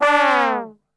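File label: D 2 trombone; Best Service; Brass Super Section; 90 s Sample CDs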